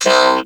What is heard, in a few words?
tone; Q Up Arts; Dream Experience; VOCOVOCAL